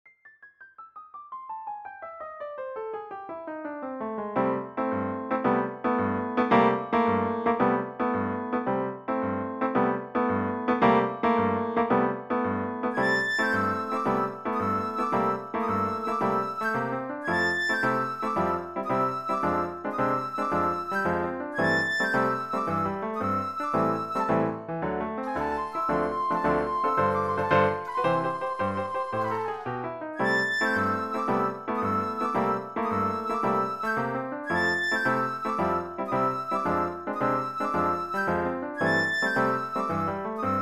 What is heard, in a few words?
solo flute music